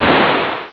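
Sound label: splash, Klappen en Vallen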